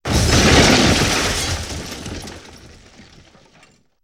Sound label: Explosion Medium Various Debris; Sony Pictures Sound Effects Series